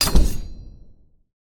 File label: capture, game chess